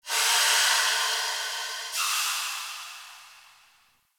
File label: Dist Steam Burst; Warframe; Venus Open World; Venus Solaris United